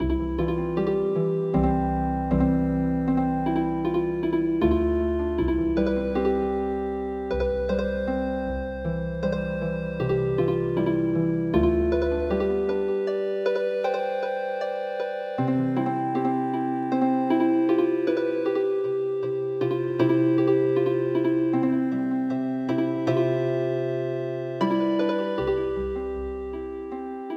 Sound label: Healing Harp